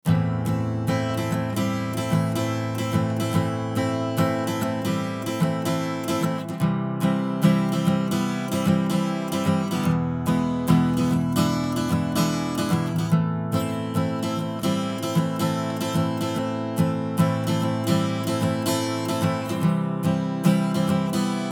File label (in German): Gitarre EQ Solid State; Gyraf Audio G14-S